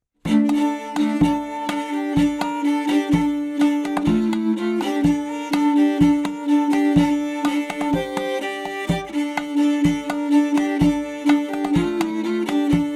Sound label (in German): Fiedelsolo Cantiga